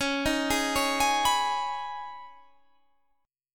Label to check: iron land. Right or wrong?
Wrong